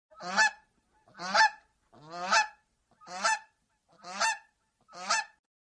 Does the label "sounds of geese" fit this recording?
Yes